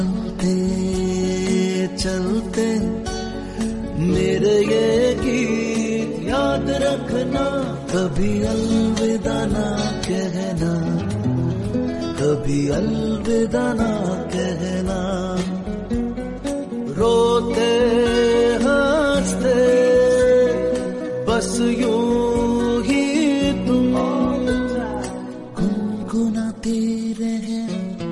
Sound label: Old Ringtone